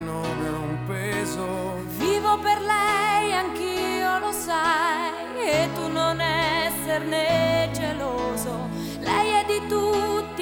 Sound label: duet